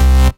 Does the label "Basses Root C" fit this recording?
Yes